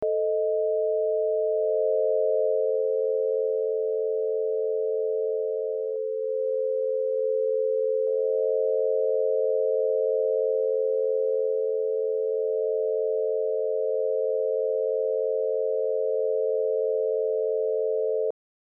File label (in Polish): skrzynka